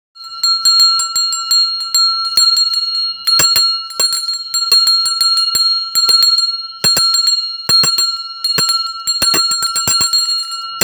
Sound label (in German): Glocke Gross